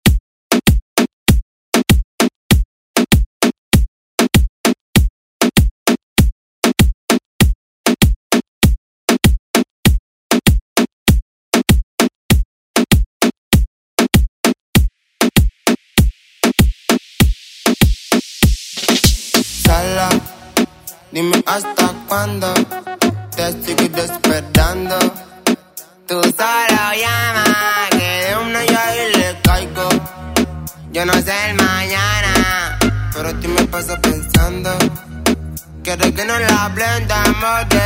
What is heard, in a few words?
Latin Urban Redrum; Deejay Hit